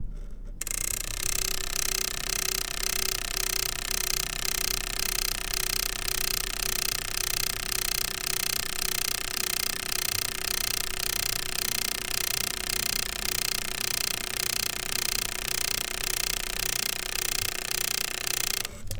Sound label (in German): Tapedeck Motor